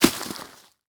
Foley Footstep Work Boots Leaves And Twigs Debris Single Step; Foley Footsteps